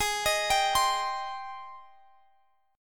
G sharp Major 7th, Guitar, Open F; arpeggiated; x,x,20,22,22,19; G#M7

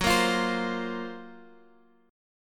F sharp Major Sharp 11th F sharp, Guitar Open F; x,x,6,5,8,7; strummed

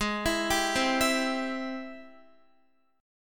Ab Augmented Major 7th Ab Guitar; arpeggiated; x,11,14,12,12,11; Ab+M7; JGuitar

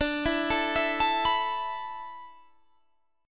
E Suspended 4th D; arpeggiated; Esus4/D

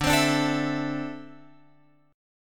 D 7th Suspended 2nd down Guitar Open F; D7sus2; strummed

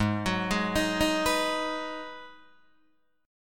G sharp Minor 7th G sharp, Guitar Open D

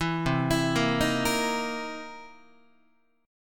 C 7th Sharp 9th C Guitar; arpeggiated; JGuitar

